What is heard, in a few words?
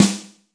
ROOM S, ROOM KITS; Crypton - DrumBase5000